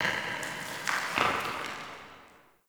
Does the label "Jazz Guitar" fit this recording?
No